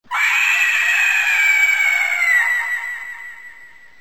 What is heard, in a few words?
scream